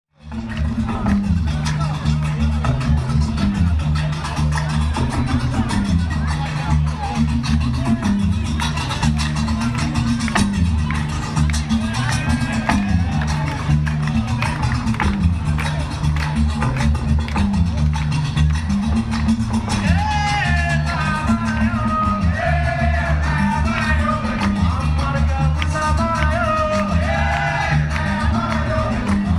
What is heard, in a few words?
gnawa